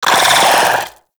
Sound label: Arachnoid Wraith vocal attack short; Warframe; Corpus